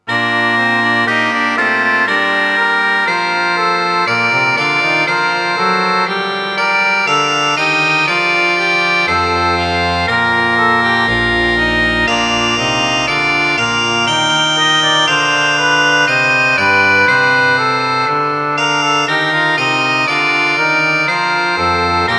nun danket all und bringet ehr, orgel

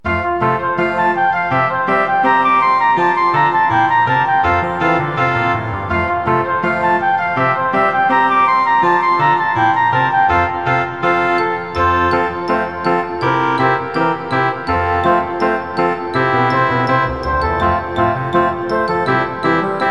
zirkuslieder playback

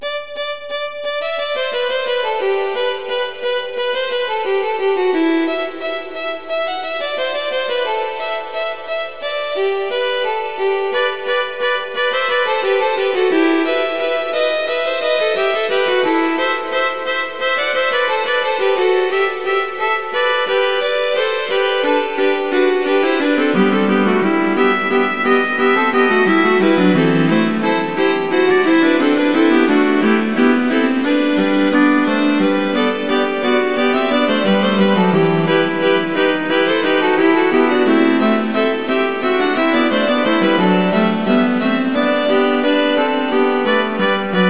kanon fuer violine viola und cello

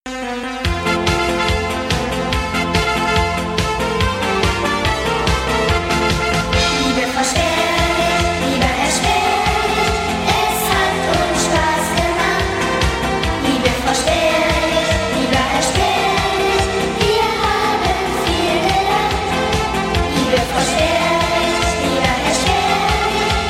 zirkuslieder

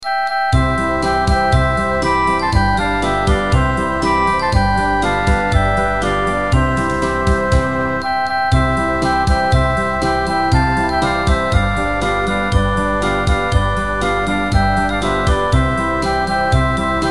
zirkuslieder der dompteur playback

